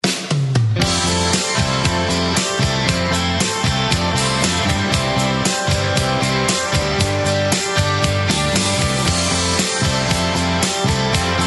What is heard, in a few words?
country track